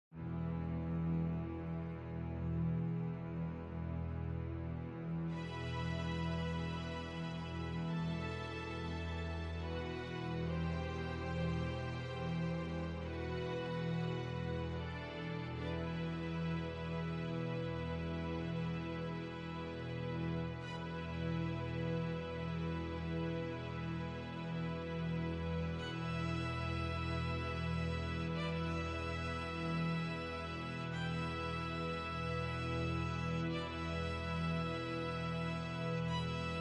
Concertino for Violin; The Fiddler of Dooney

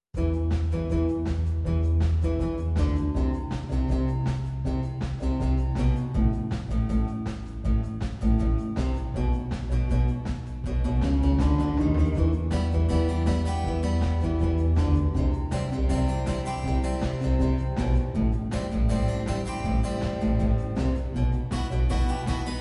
Con Moto Plucked Strings

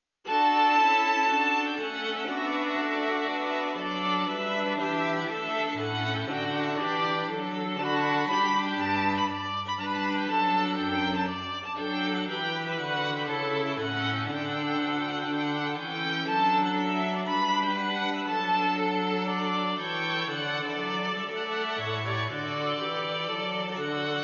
Trumpet Voluntary

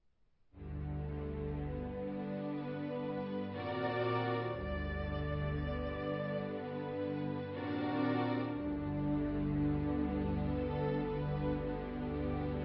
Violin and Strings; Nessun Dorma

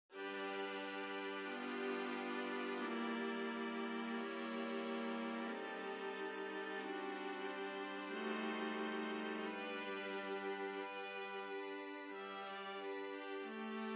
Viola Quartet; Recueillement

